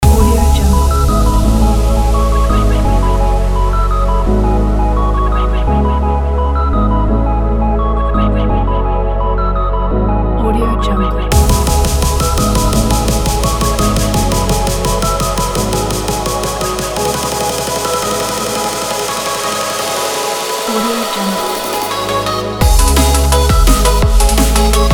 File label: pop drum bass